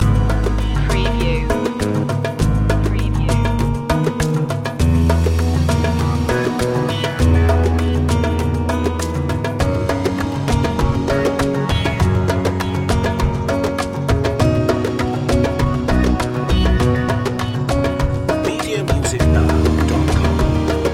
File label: lost dream loop